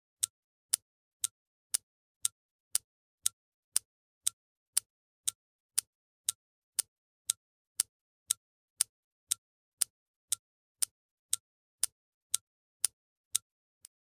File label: Clock fast ticking